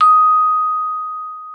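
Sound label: vibraphone D#6; Xsample VOL-4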